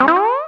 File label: slide